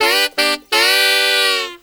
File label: SAX, W.AFR.SAX; Spectrasonics; Heart of Africa